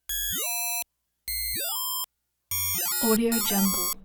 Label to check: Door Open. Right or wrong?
Wrong